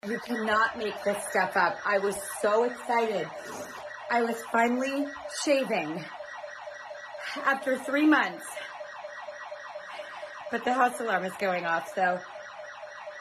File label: burglar alarm; DREW BARRYMORE